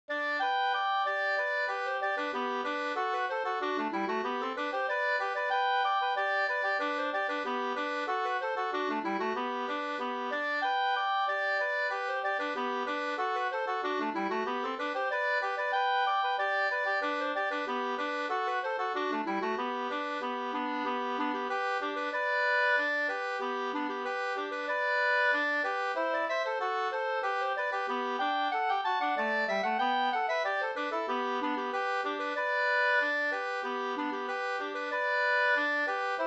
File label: polka polkett; e Gas-Anders Up Nr2 2st